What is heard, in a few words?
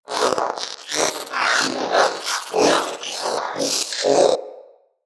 Companion Vocal Breath Run, Pets; Zanuka